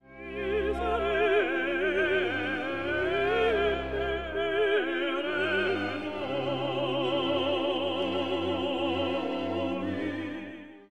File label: Agnus Dei Duets